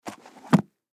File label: Book Large Set, Book Set Down Cement; Offices; Blastwave; Foley